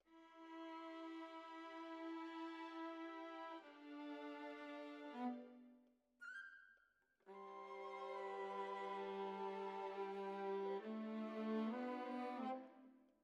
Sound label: Violas, jazz for piano